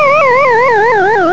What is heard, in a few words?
die; BlitzBombers